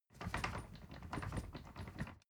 ladder wood rattling long

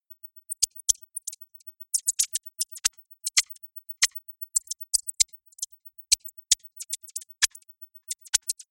electronic bird chirp long

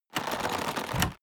crossbow load

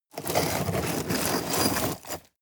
scrape rocks hard

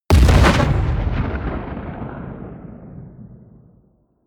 bomb fragmentation close

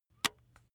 razor attachment retract

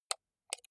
computer mouse right click slow

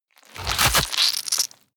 rip bone and flesh

